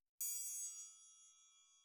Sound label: TRIANGLE CLOSE